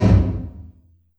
LR LT909, TR909

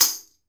toy tambourine

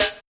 Yamaha DJX 2B, SD Analog Open Rim

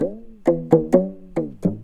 PAPAN, Indian Traditions

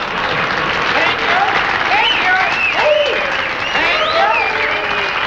Thankyou and Applause

